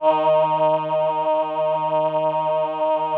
VP-330, String Machines

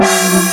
GONG, FAIRLIGHT CMI IIX, PERCUSN; PERCUSN1

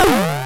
synth siren; E-MU Formula 4000 Series Vol. 5; Protozoa; Orbit Instrmt

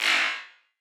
Wider Clap; stereo toolkit samples

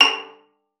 PIZZ, VIOLIN

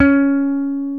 P BASS C#5, pick bass

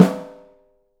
OHSTSN L, 3D Drums

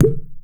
PLOPP, Anime Sound FX; AKAI Anime Sound FX

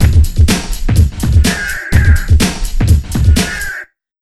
BIG 125, Big Beat Drumloops; USB Soundscan vol.25; AKAI

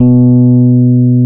B 0, Electric Pianos; Wizoo

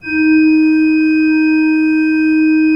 SWL D3, Cathedral Organ, SWELL WERK; Propeller Island

